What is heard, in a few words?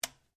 RIFLE REMMINGTON700 7.62MM SAFETY ON; A selection of domesttic firearms; Rifles; Guns